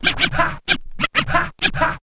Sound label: scratch kit, vinyl